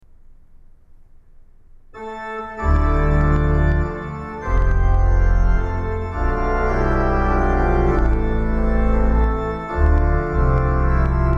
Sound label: DORIAN MOOD